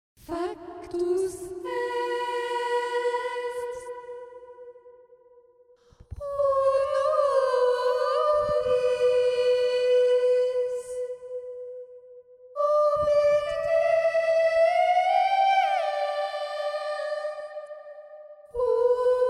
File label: soprano; passionne christus